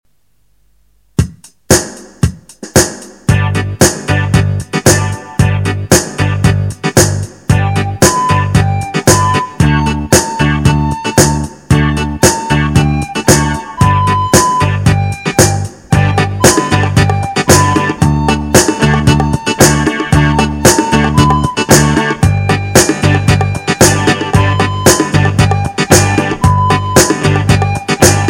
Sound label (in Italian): Motivetto con flauto